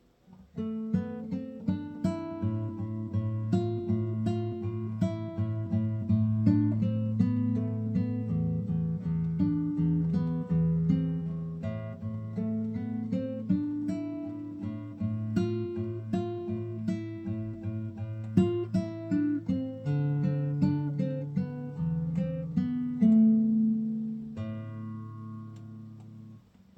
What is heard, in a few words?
Two Part Solo Etudes; Benchu Ni